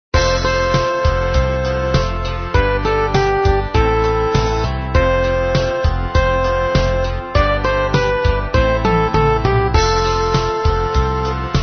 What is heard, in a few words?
midi